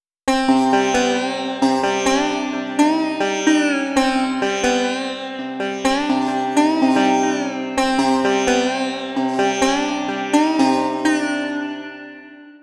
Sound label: sitar slide